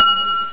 Clock Chime; From Aminet

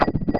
block awaking; crack-attack

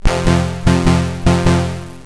Level Start; Io

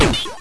Dragon Hit; Io

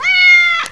Kitty; Found In A School Mac Lc3

